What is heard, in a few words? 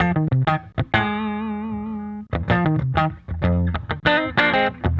Guitar, 70s Analog Funk